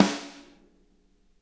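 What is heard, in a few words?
snare edge; Sonic Reality; Interactive Drum Kits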